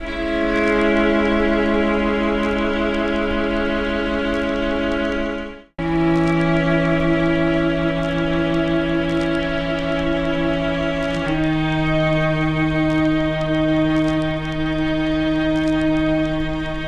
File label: String